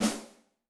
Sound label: Ambient, Yamaha DTXpress-II snare